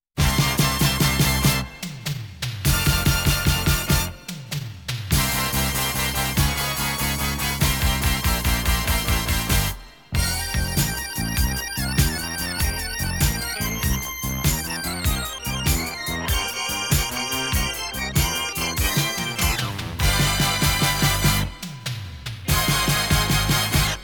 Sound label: Up Tempo 'strip Blues', music